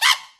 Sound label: Bird White Damage; fowl